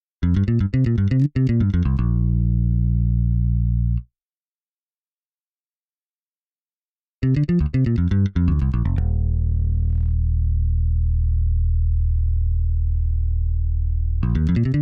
bass

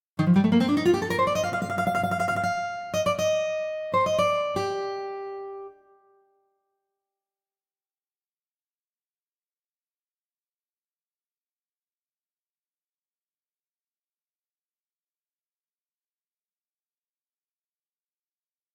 acoustic

